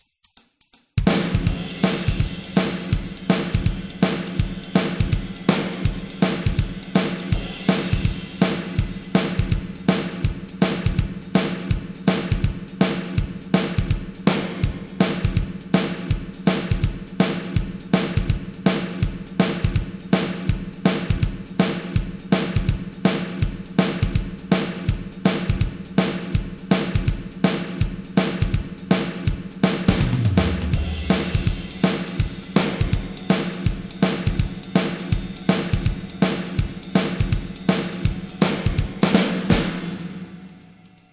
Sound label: Drums; Mr. Tabs